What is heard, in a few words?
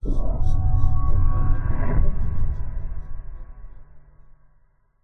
sound design cinematic swell dark strange moduated